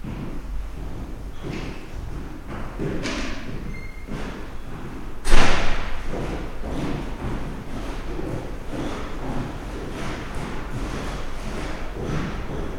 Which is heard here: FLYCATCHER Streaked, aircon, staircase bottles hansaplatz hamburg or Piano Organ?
staircase bottles hansaplatz hamburg